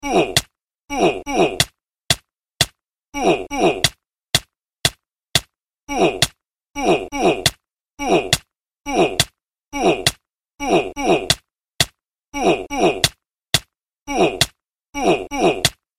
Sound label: oof